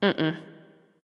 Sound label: nv disagree; smite; awilix